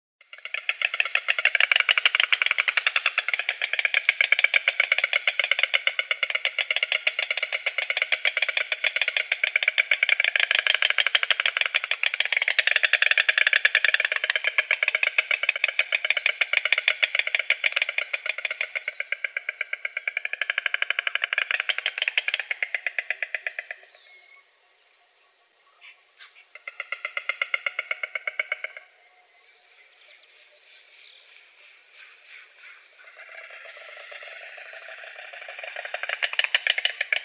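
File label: bird voices, white stork, Ciconia ciconia; aist belii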